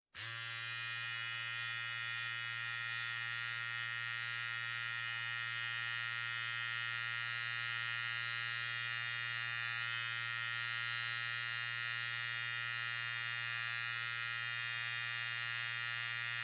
Electric Razor On Run Turn Off, Household, Electric Razor, Turn On, Run, Turn Off; Bathroom